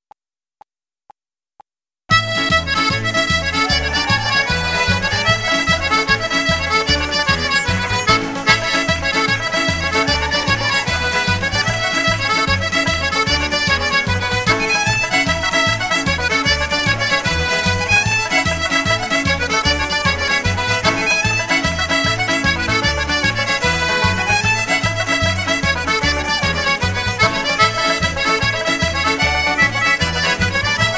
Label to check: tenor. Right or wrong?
Wrong